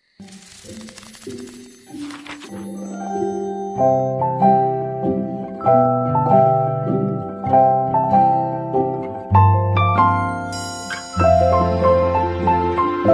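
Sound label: backing track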